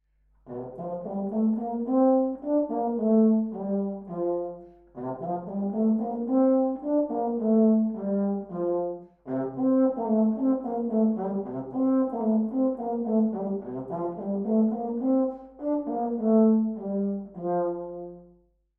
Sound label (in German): Mustersound Posaune; blaeserjugend; musikmentoren